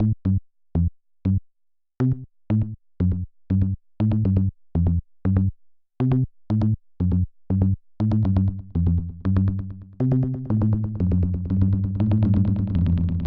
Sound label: Zendelay; Ninja Tune